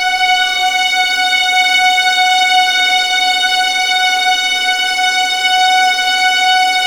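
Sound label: F#5 legato violins; East West; The Ultimate String Collection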